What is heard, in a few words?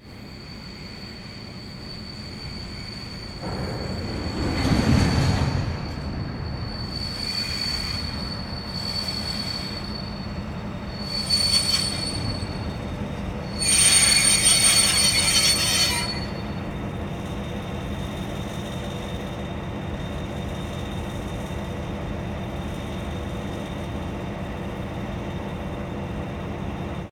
train station squeal; best service Blue Box